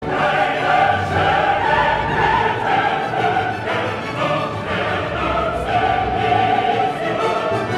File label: Presto Allegro assai Choral Finale; krips; Ode to Joy